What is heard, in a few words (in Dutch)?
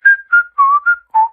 Fluiten